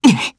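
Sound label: Vox Landing Japanese; Fluss; kingsraid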